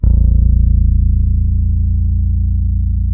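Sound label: B 1, French fretless bass; Spectrasonics; Bass Legends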